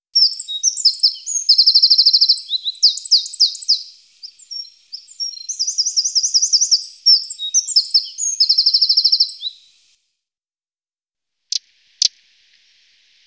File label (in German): Zaunkönig